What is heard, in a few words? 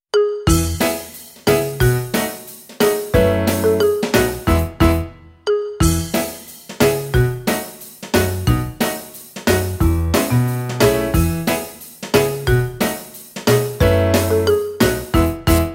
Backing Track